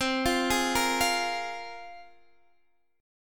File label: C 7th Suspended 4th Sharp 5th, C, down, Guitar, DADGAD